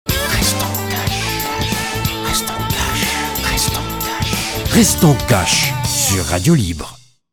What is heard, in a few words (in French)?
jingle